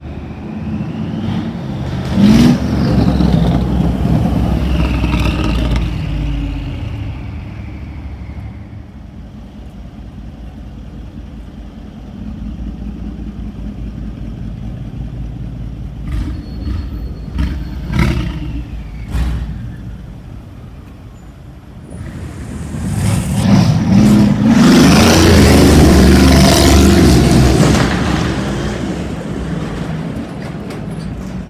G Class exhaust

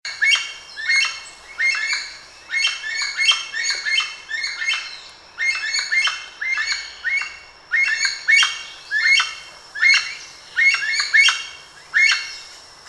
Aracari

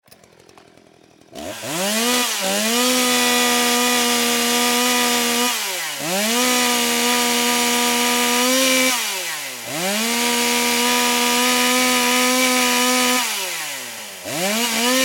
chainsaw sound

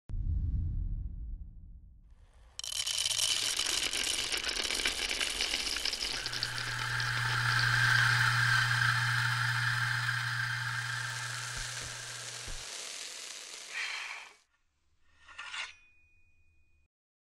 Coffee grinding

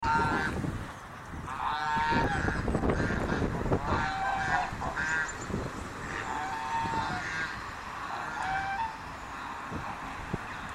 Swan goose

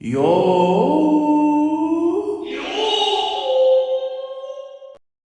Samurai

